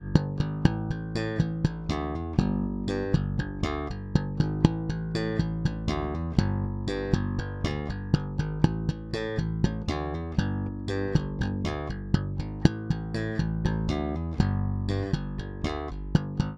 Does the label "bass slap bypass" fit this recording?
Yes